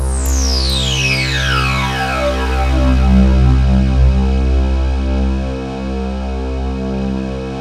SWEEP; Syntec; Wall of Sounds

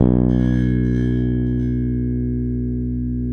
SPEC SUSTAIN, Bass

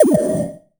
Misc Short FX

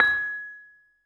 LAMELS G#5, Asian Traditions; USB Soundscan vol.60; AKAI; LAMELOFO

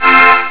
chain choir; Amiga Soundtracker Sample Packs

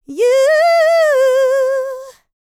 LICKS, Vocal, R&B; ILIO; Dance; LT R+B

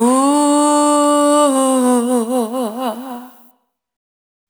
Single Voice Melodic Loops; Singles

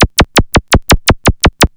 pulsating; Zero-G Ambient 1; PULSATIONS

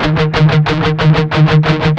Guitars; Spectrasonics; Hans Zimmer Guitars VOL-1; MED 16TH

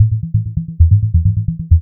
INST LOOP, House Side Of 2 Step; USB Soundscan vol.51; AKAI

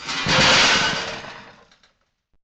THROW; PERCMETALIC

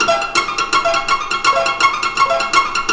KORA; Zero G - Ethnic

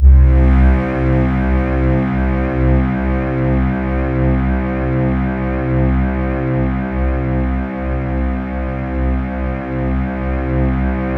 C2, Synth Leads

